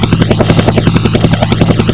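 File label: Helicopter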